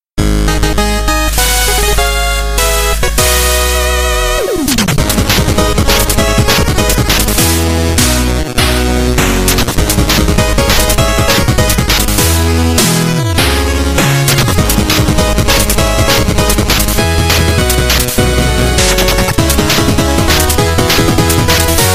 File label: Battle Music